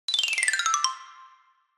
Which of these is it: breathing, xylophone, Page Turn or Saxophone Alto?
xylophone